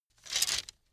bamboo sticks; objekte; holz

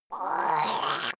monster gurgling high; kreaturen

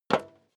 step leather shoes metal dry single stair up; schritte

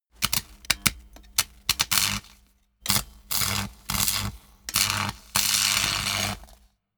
spark; comedy cartoon; elektronisch

